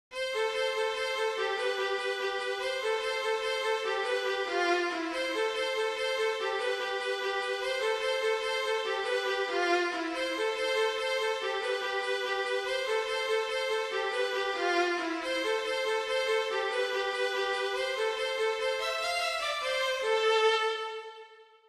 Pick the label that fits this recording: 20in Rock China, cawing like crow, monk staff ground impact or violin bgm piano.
violin bgm piano